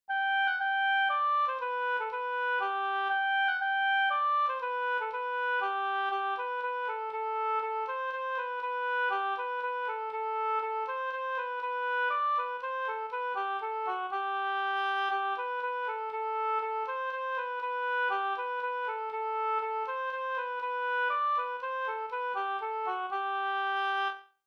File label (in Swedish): slängpolska